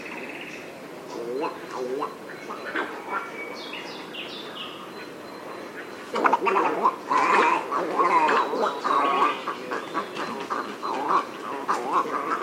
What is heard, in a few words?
little egret gargling and chuckling; soundscapes